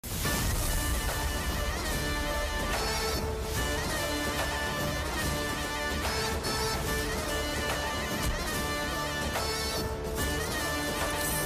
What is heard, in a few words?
police station entry bgm; pushpa 2